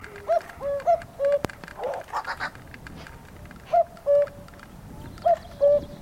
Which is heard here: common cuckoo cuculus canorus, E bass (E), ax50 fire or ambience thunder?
common cuckoo cuculus canorus